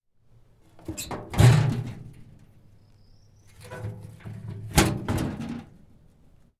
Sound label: Mailbox Open Close Outside